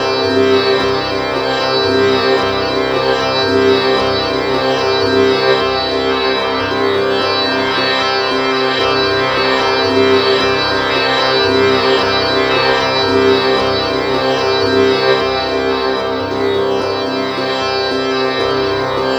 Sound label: Infinite Sitar, Strings; Sonic Foundry (Sony Creative Software); Myths of Technology